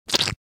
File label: Ketchup bottle squeeze press squirt; cooking